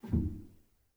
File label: rel, Grand Piano; bitKlavier Grand; Piano Bar